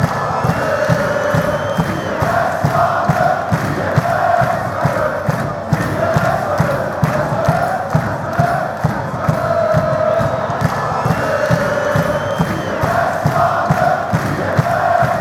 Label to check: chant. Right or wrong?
Right